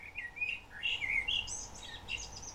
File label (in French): Merle noir chant